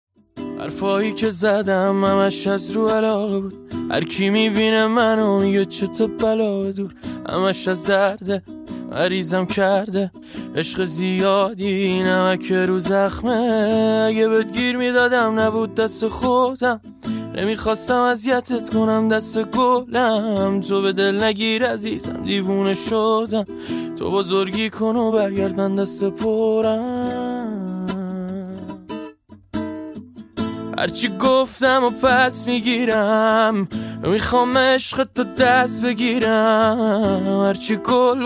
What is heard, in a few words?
Guitar Version